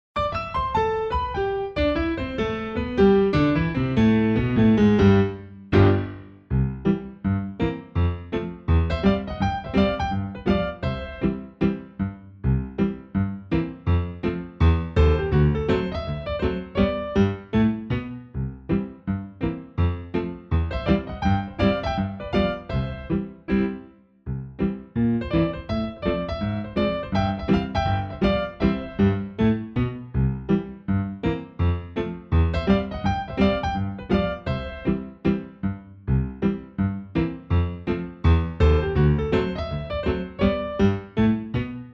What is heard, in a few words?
piano